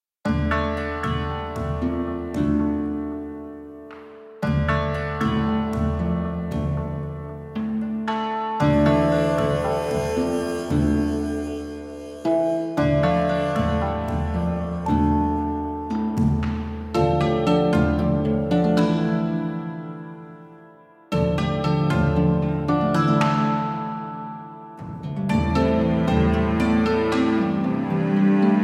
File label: ringtone